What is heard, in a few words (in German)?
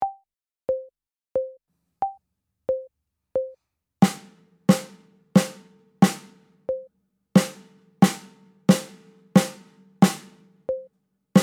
Duett Snare mit Click